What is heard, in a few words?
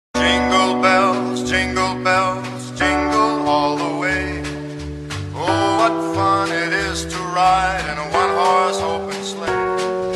Jingle Bells Dubstep